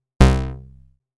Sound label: Short, synthlead